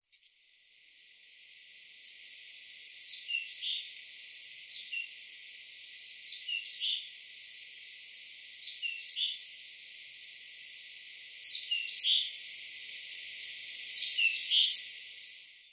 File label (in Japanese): singing